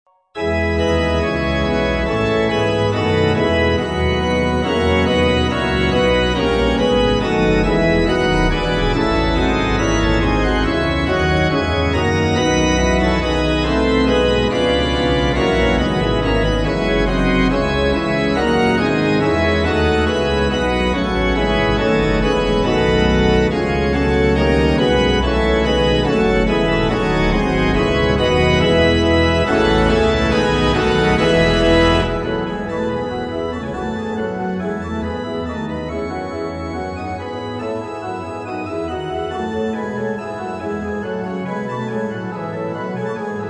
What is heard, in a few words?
reverb, organ; corum